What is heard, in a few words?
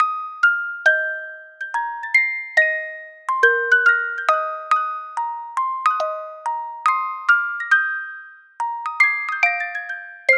Dixie (Music Box); animal crossing new leaf welcome aamiibo soundtrack